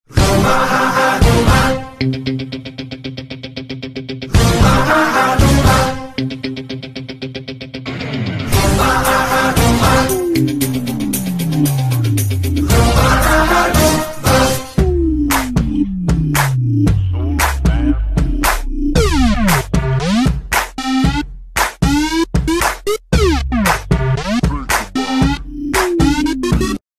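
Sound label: Ringtone background music; Jilla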